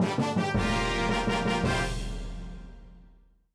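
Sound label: Quest clear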